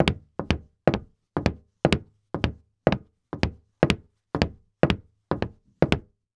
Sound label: Foley Footstep Cowboy Boots Fast Walking On Hollow Wood Surface; Foley Footsteps